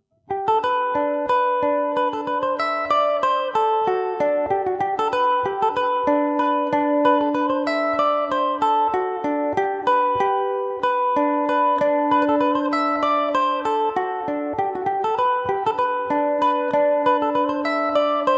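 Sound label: polka G major and D major revelation, irish mandolin; The Belfast Polka; Revelation Jaguar electric mandolin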